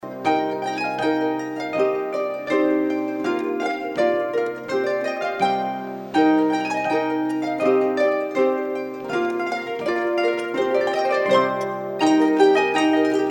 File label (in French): harpes